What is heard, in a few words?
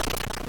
brick break; smb